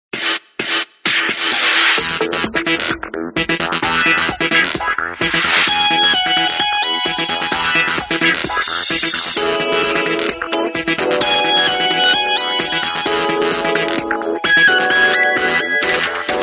cool samsung ringtone

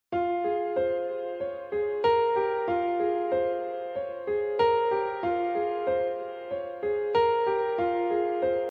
ringtone

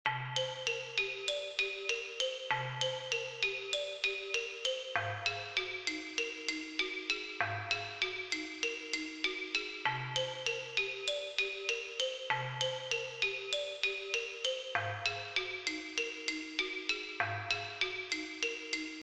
ringtone

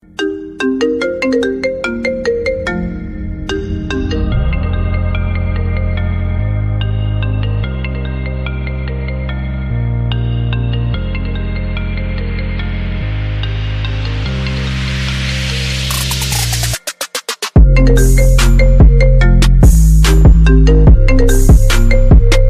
iphone 8 plus ringtone

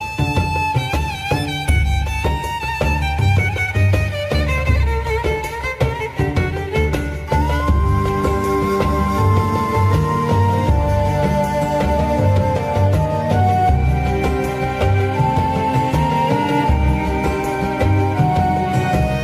tuerk elektro ringtone

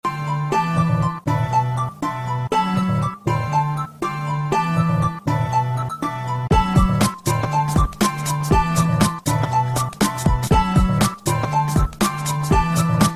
apple startup remix ringtone

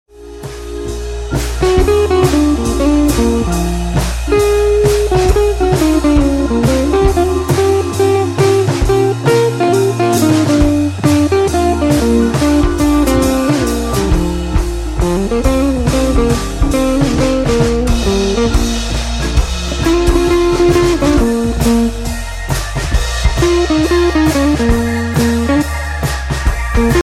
crazy blues ringtone

